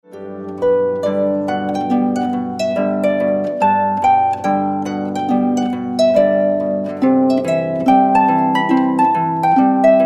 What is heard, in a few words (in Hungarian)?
harfa; adventi